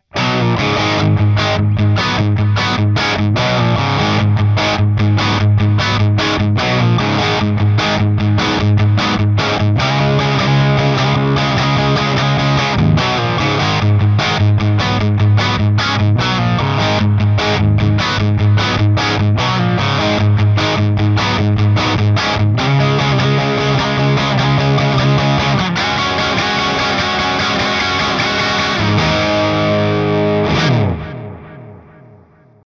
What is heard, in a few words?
Rock; iRig UA